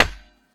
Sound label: stone hit; VoxelEngine; blocks